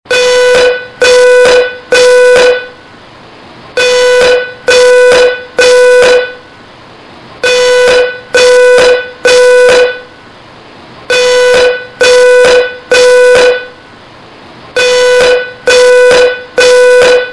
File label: Smoke Alarm